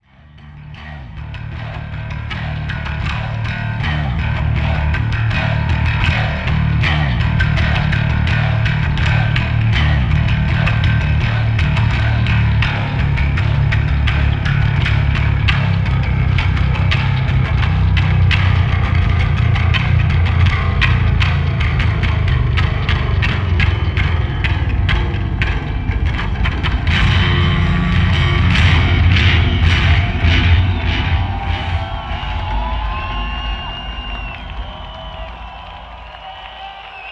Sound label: Bass Solo